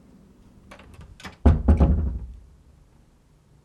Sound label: Closing Door